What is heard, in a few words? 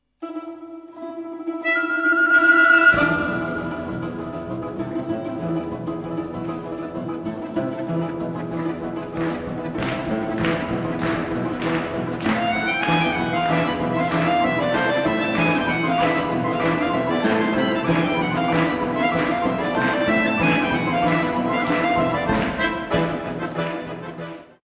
Russian folklorist song; Kalinka